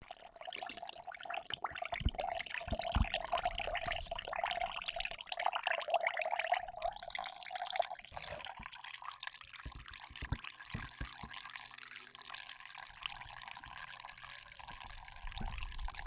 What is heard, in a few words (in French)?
ruisseau